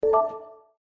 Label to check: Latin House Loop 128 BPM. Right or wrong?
Wrong